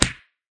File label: punch; Crash-Course